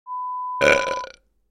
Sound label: burp sound